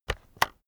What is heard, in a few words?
keyboard external enter press slow heavy